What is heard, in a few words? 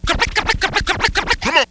scratch, rat; scratchs